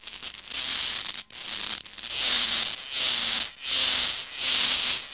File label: nature